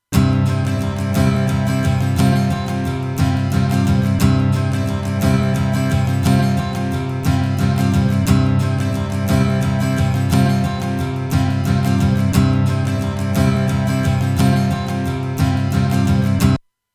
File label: Guitar Solid State; Universal Audio 710 Twin Finity